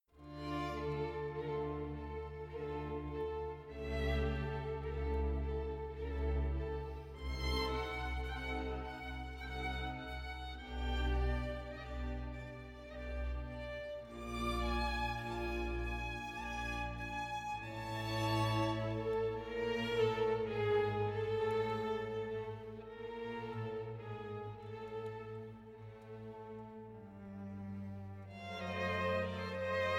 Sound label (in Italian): Sinfonia in Re Maggiore con trombe andante